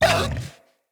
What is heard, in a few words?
Kubrow Feral Vocal Pain